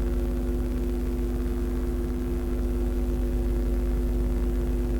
gate ambient

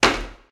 snap

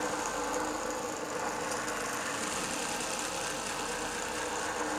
wheel

